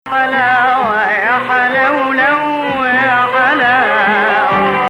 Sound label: Hijaz